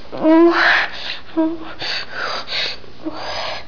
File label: moan; Virtual Valerie 2; Desktop Themes